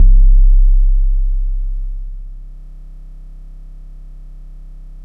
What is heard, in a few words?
SUB C2, SUB BASS; Explosive Jungle; USB Soundscan vol.05; AKAI